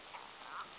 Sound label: Unknown sp Sound